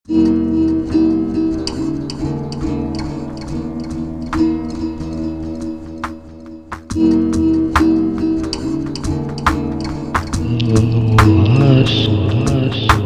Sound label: Strum